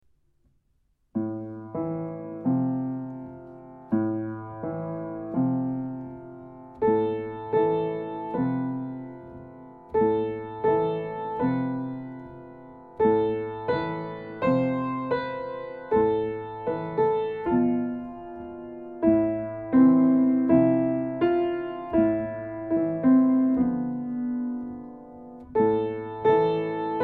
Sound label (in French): Sakura Chant traditionnel japonais